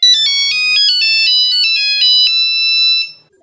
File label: nokia ringtone